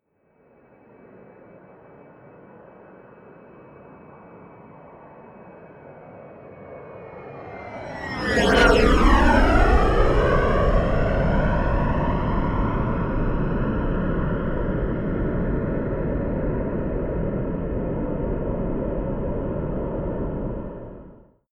plane bombardier private flyby right to left close